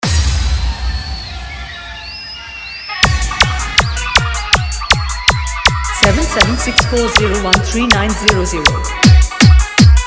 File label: Bhojpuri Full Dhollki Bass Rod Dance Mix; Dj Anurag Babu Jaunpur